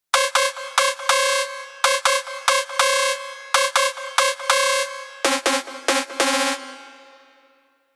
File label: LD Hard Jaw, Sylenth1; alonso 19 year anniversary pack